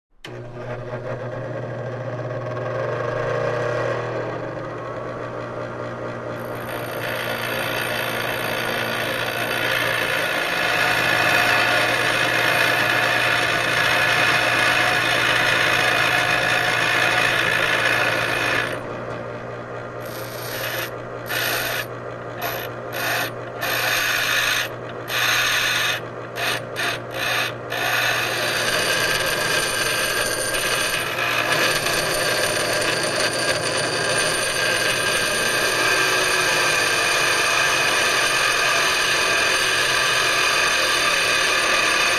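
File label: Grinder Grinding Metal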